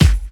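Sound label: snare drum bass drum, Dance Mega Drums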